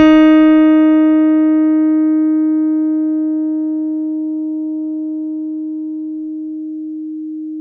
D#4 F, Piano cp80

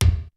KICK SNARE; Kid Nepro; Elite; KICKS SNARES

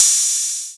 Hat O, Hi Hat; Zero-G Club Techno; Percussion

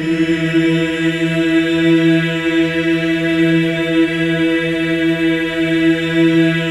EES E4, MEN EES OCT, Symphony of Voices; Spectrasonics

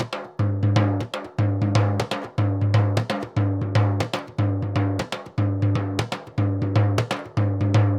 Surdo Bomba 120, Drums & Percussion, 120 bpm; Best Service; Festa Latina VOL-1

